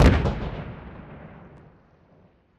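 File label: Stalker Nuke Destruct Explosion Distant; Titanfall 2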